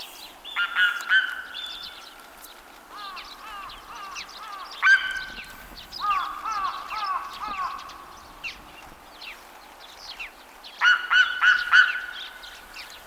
Haliaeetus pelagicus, Steller zeearend; roofvogels; Accipitrinae; Accipitres; Accipitidae

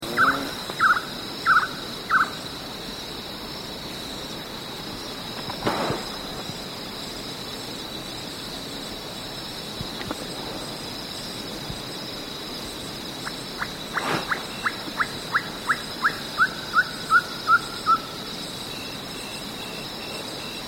Glaucidium brasilianum; Braziliaanse dwerguil; uilen; Strigidae

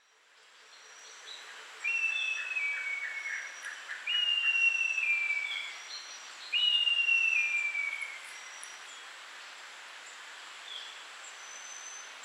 Urotriorchis macrourus; Langstaarthavik; roofvogels; Accipitrinae; Accipitres; Accipitidae

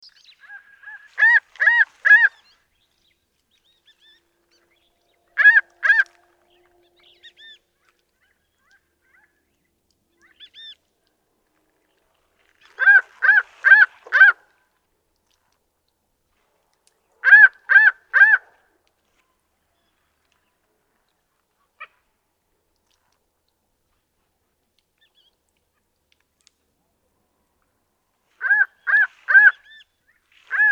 Buteo augur; roofvogels; Accipitrinae; Augurbuizerd; Accipitres; Accipitidae

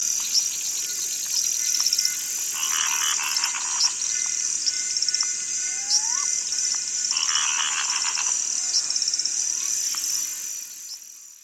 Bubo poensis, Kleine oehoe; uilen; Strigidae

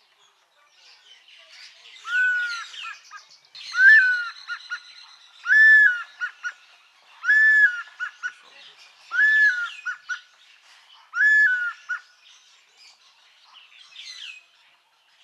Melierax poliopterus; roofvogels; Accipitrinae; Bleke Zanghavik; Accipitres; Accipitidae

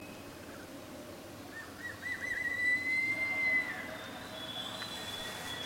Spilornis kinabaluensis, Kinabalusslangenarend; roofvogels; Accipitrinae; Accipitres; Accipitidae

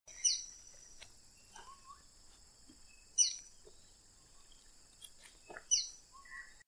Microhierax fringillarius; Musvalk; roofvogels; Falconidae; Falconae

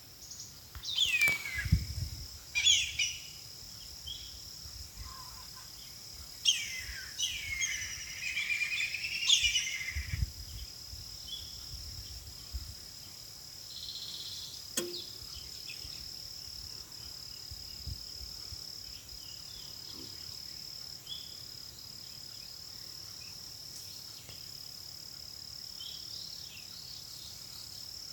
Haliastur sphenurus, Fluitwouw; roofvogels; Accipitrinae; Accipitres; Accipitidae

